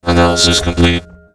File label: applause; osu!; Slightly Minimalistic v2.1